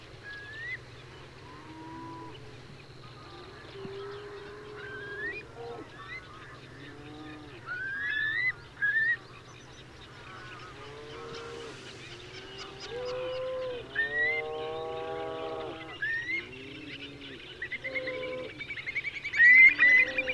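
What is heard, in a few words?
eurasian curlew arquata flight call, whimbrel phaeopus flight call; karrend wiesen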